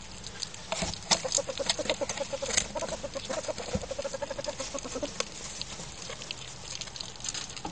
seskes mamos guguoja jiems, ferret

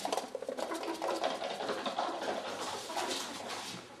gugavimo pavyzdziai, ferret

